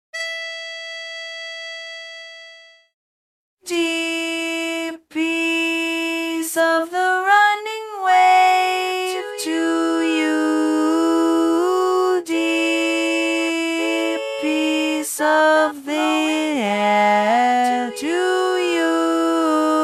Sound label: Alto 2 predominant; A Gaelic Blessing; learning tracks